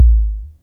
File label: SYNCUSSION